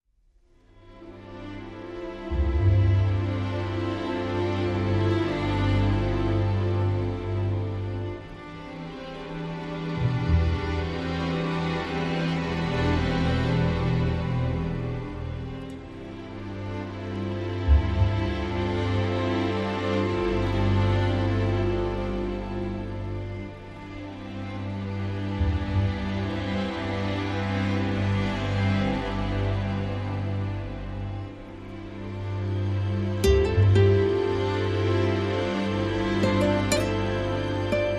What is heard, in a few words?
Intro with Guitar